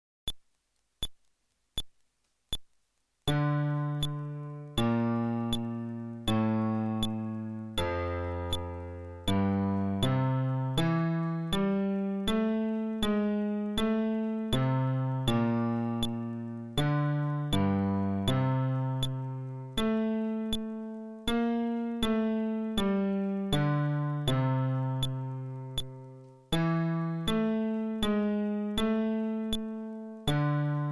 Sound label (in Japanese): フレットレス (テンポ80)